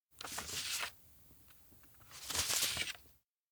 book flip; anemoi

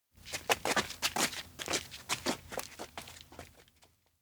steps outdoor to observatory; awayuki; anemoi

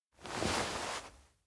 turn to cockpit; anemoi

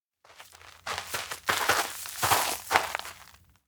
steps outdoor mountain road walk; rikka; anemoi

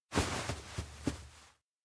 fan clothes; anemoi

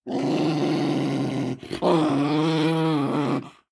dog garururu; anemoi

